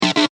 Electric Zap Double, Two short, intense bursts of electric buzzing; LYN111 package of Electricity sounds